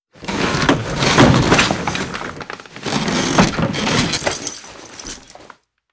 chair struggle; Modern Warfare

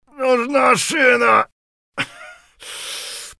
bear3 broke leg; Escape from Tarkov

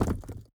drop stone; Rust

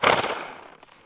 Horse Snort; Civ4 warlords; Resouces and Improvements

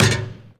basketball backboard; Call of Duty Modern Warfare

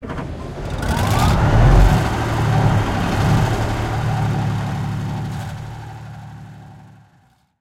tank engine int start; engines; War Thunder

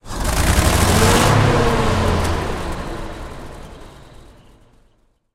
m1 engine load, tanks; War Thunder

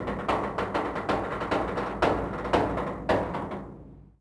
stress metal; World of Tanks Blitz; ambient canal

